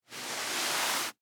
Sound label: Rag Wipe Long, Foley; Cleaning; Sound Effects:Household; BlastWave